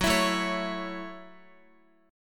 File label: Gb Minor 7th, Guitar; strummed; JGuitar